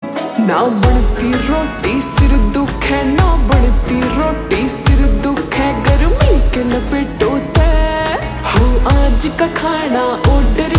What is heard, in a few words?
Zomato Ringtone Slowed Reverb